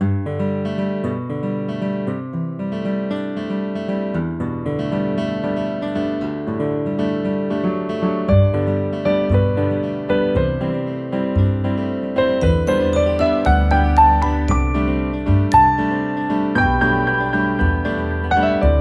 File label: Hotline Piano; Free Mobile